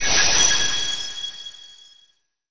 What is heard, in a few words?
CLEAR MISSION; Tales of the Rays